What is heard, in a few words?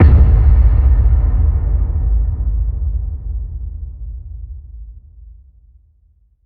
HIT Low